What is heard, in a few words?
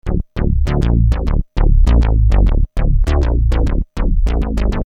Syn Bass 100 D, Techno Synth Loops; Sonic Foundry (Sony Creative Software); Signals I